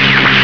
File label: CANNON; VERITECH